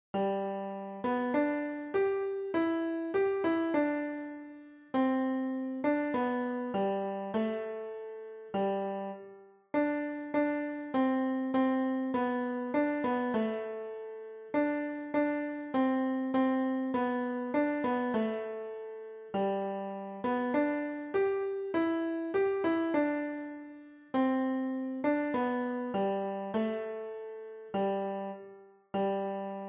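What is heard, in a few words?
piano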